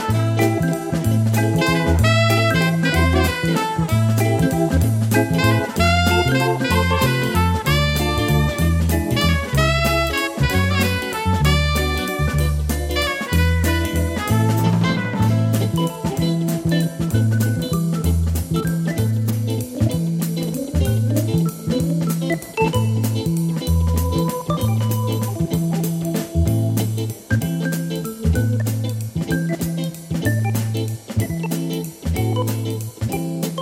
pop-jazz

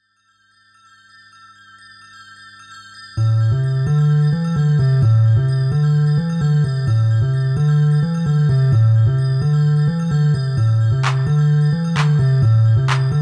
Bass Dub

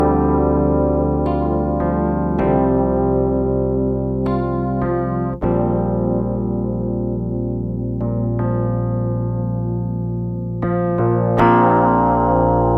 Electric Piano Only

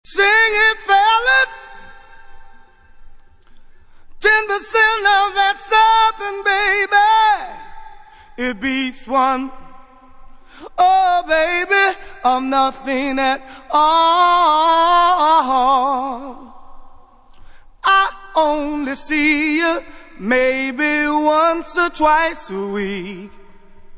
acappella

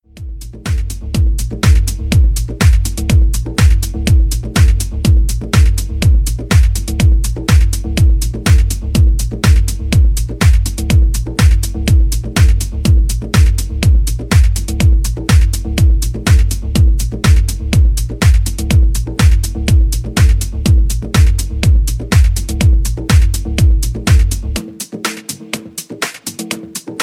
Ancient Tribal Drums

